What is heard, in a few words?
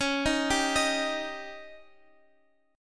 C sharp Suspended 2nd Flat 5th C sharp Guitar Half Step Down